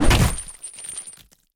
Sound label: Chain Bat Hit; Scrap Weapons; Zomboid